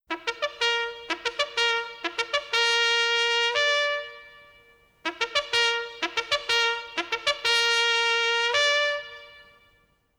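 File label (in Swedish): Inryckning jägarhorn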